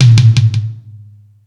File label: Space Drums, E-MU Pro-Cussion; drum machine